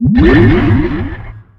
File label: Pickup Scifi Health